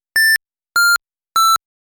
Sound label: Shamisen; Taito